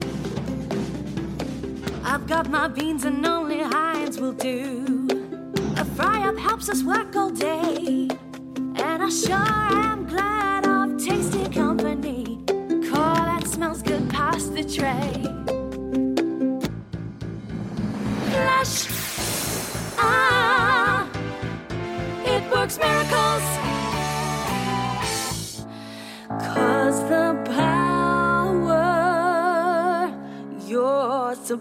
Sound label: Singing Jingles Songs